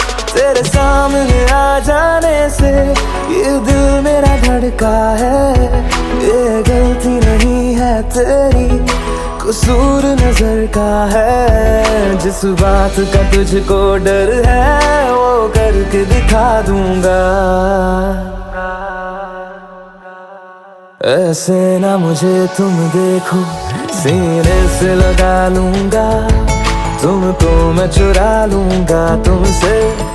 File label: Romantic Song Ringtone